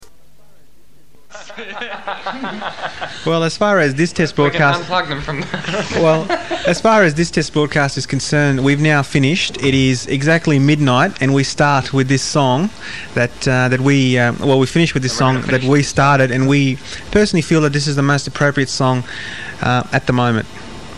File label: Closing Medley; highlights and short grabs